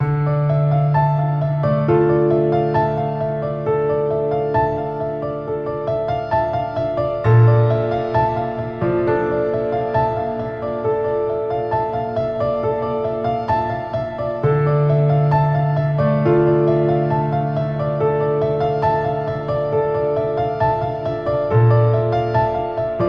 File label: Piano